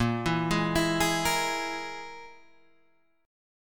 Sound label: E Major Flat 5th Bb